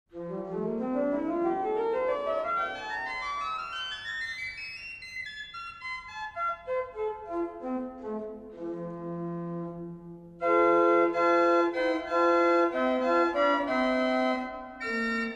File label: Octav 4; Schultheis Schlimbach